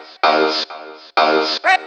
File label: House Loops, Vocal Loops House 128BPM